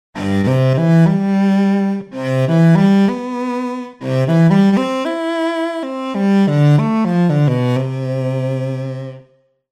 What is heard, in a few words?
Solo Cell; TURBO VL